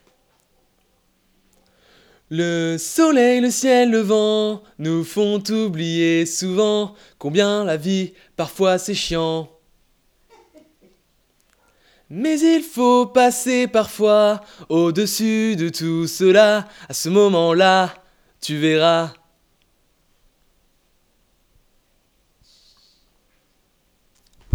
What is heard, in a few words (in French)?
Voix principale